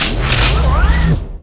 bfg reload; gusanos promode

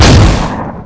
gauss; gusanos promode

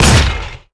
cannon fire; gusanos promode

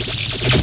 bfg charge; gusanos promode

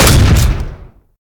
grenade launcher; gusanos promode

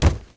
knife flesh; gusanos promode

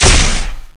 bazooka; gusanos promode

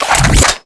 bio reload; gusanos promode

